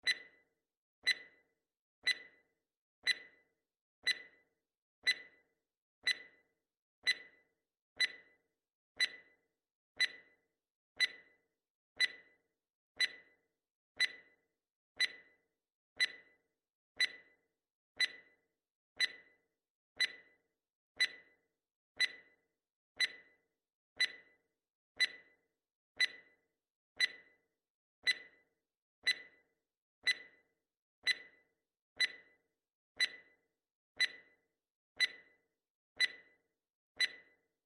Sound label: Countdown Timer Circle Clock and Sound Effects